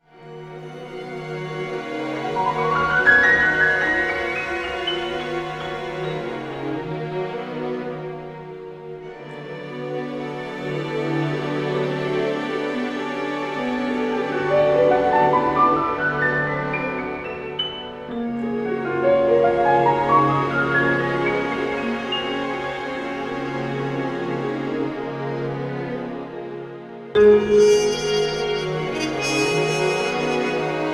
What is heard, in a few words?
love is like jazz